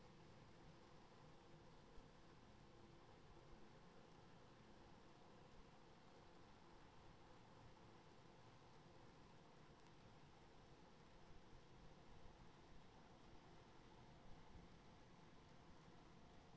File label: HYDROPHONE 16000Hz; JASCO AMAR HYDROPHONE 740